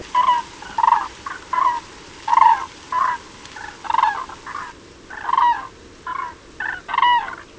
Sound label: call, Grus grus, Crane, flight calls, these rattling calls are uttered mainly when landing. Background: wind noise